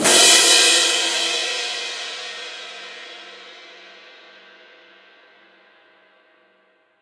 Cymbals Crash

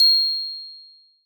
C8 bell

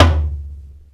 tom low floor; osu

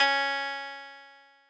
Koto C#3

